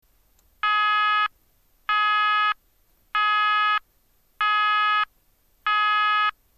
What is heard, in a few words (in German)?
ton, Signalgeraete